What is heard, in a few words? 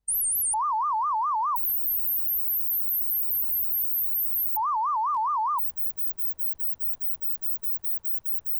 artifacts warble, VHS